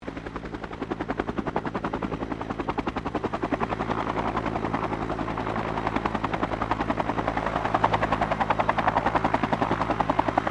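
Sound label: helicopter Bell UH1 Iroquois hover; RAN UH-1 Iroguios; Helicopters (Sound Effects:Vehicles)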